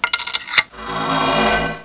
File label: key; rott